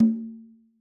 conga Single Hit Right Hand; Raw Perc One Shots; Slate; SD SEISMIC Drum Sample Pack

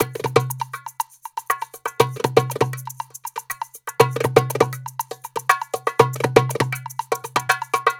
percgroove 120, Perc Grooves; Slate; SD SEISMIC Drum Sample Pack; Signature Perc Grooves

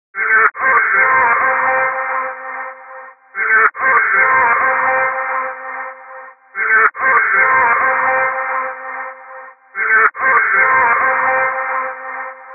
vocal chop, 150, Fm; Lights; Slate Digital Omnivox Vocal Sample Pack; Chops

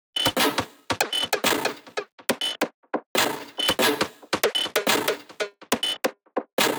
perc 140; Drum Sample Pack; Loops; SD SEISMIC; Slate

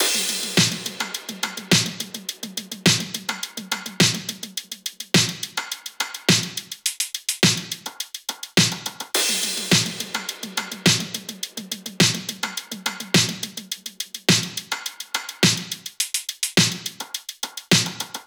top, Drum Loops, Top Loops, 105; SD MIDNIGHT Sample Pack; Slate

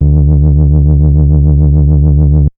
Synth oneshot Long LFO D, Synth Bass; Synth Bass One Shots; Slate Digital BASSHOLE Sample Pack

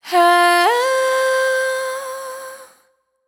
riff Flip Fm wet, Vocal; Slate Digital Omnivox Vocal Sample Pack

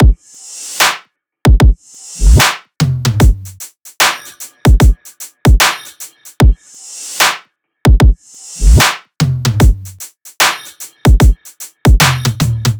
drums 150, Full Drum Loops; Slate; SEISMIC Drum Sample Pack

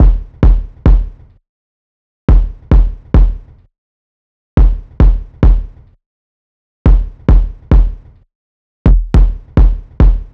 kick Loop, 105; Kick Loops; Drum Sample Pack; SD SEISMIC; Slate

